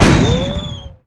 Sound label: Cannon Fire Whistle; Weapons